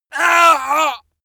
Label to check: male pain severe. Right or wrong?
Right